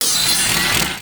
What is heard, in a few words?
Turret Ready Generic; Warframe; Missions; Zariman; Zariman Turrets